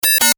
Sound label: Tech beeps, Beeps, High Tech; Tech Sound Effects; Tech (Sound Effects:Science Fiction); Stuart Duffield